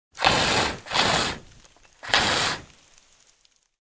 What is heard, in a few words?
Flamethrower three times